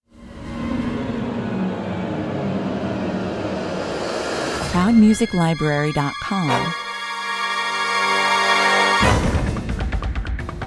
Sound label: Industrial- electronic-orchestral cue, big and powerful, 129 bpm